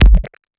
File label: Lat, Kik, One Shots